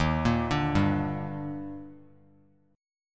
D sharp Major 7th; arpeggiated; JGuitar

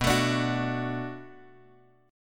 B Minor 7th B Guitar Drop D, chord

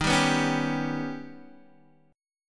Eb Augmented 7th Eb, Guitar DADGAD; x,6,5,4,4,5; down

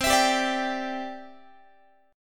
C Major Add 9th, Guitar; strummed; JGuitar

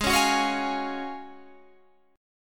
F sharp Major 7th Suspended 2nd, G sharp, chord

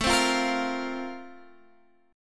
A sharp Major 7th Suspended 2nd

